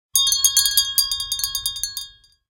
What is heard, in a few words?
Peridot, Nokia Alerts; Windows Phone apps